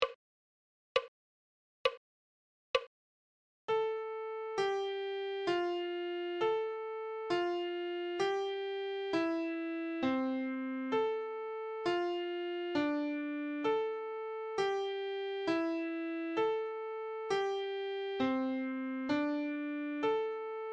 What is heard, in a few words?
treble clef adagio